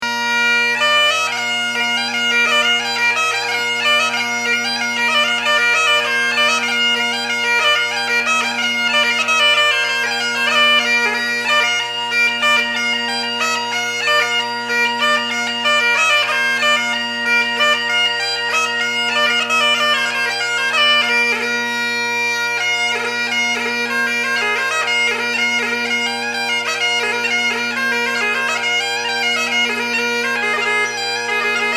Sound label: Hornpipe & Ten Penny Jig, bagpipes